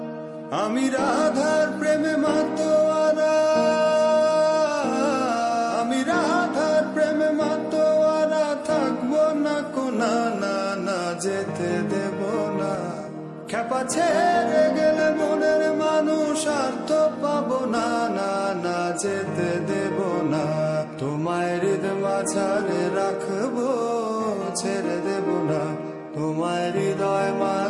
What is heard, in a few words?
Bengali ringtone